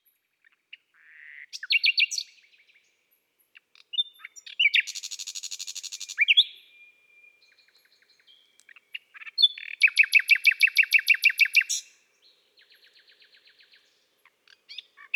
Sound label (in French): Rossignol philomele Chants d'oiseaux des bois et des forets